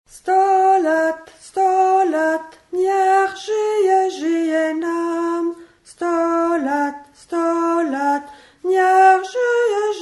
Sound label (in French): Soprane; stolat